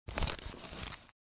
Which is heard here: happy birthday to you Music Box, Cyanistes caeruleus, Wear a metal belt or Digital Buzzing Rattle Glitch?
Wear a metal belt